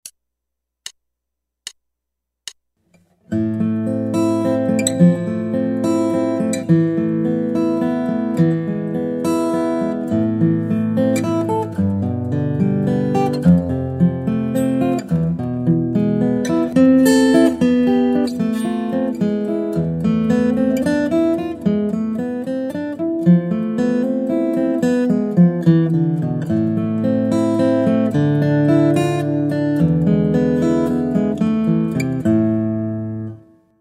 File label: Guitar